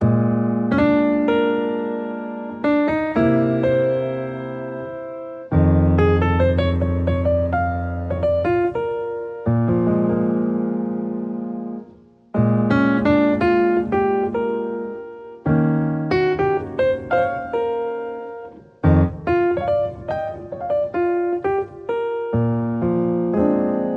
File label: Easy Jazz Blues